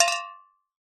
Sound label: human tooth spit out drop into metal bowl dentist